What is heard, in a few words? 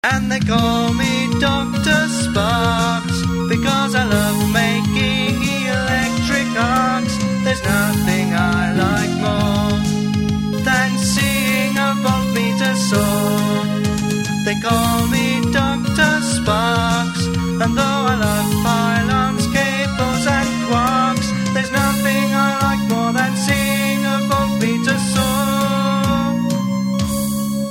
Chorus; Doctor Sparks; Come on down to the lab... And meet Doctor Sparks, a zany scientist, crazy for electricity and all the wonderful things it can do. Dynamos, Generators, Vandergraph Machines and Oscillos